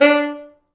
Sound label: D3, Pop Brass; Best Service ProSamples vol.19; AKAI